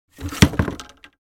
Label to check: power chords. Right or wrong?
Wrong